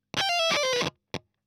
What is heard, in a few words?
EL Fuzz End